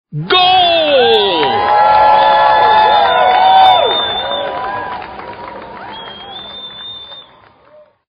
Happy Goal

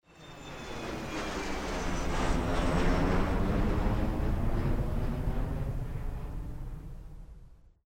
plane takeoff

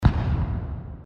gun fire

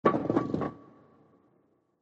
pin

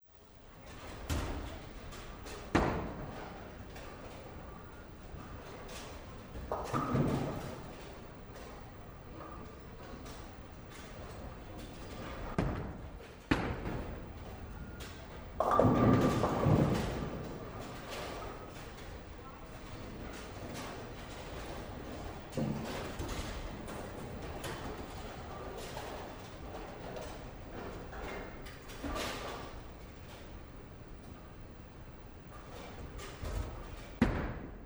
bowling Ambience

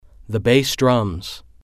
the Bass Drums

